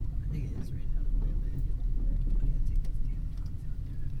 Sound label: Windshield Wipers And Mom Talking; Running Errands On A Rainy Day